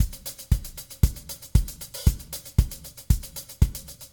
116bpm 8beats; LoopsBitsSamples

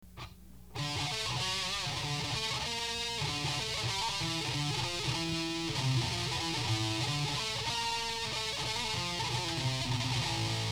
Sea Chanty

